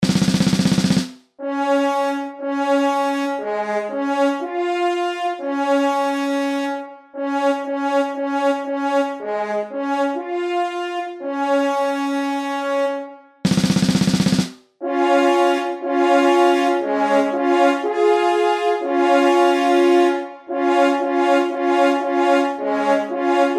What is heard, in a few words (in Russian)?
Pionerskij gorn Otboj